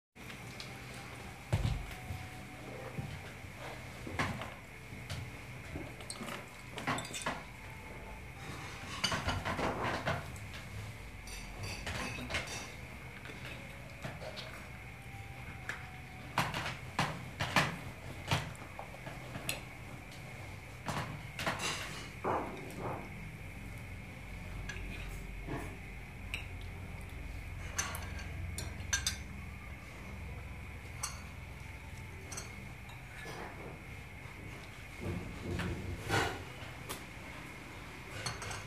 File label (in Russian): fonovye zvuki v kafe